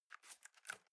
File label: cardboard box open unfold single; objekte